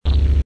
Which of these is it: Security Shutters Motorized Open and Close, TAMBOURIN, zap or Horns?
zap